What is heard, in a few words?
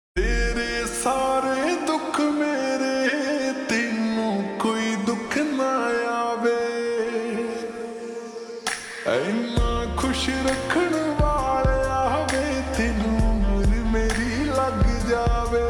Ringtone Slowed Reverb